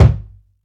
thumpy steel kick drum g sharp key, bass drum single shot tuned to the G# note